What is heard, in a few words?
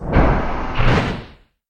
rocketpods raise; gmod; lfs tx 130